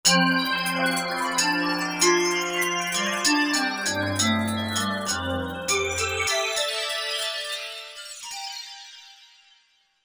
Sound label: Metaor, Korg MSC-14S Sound Effects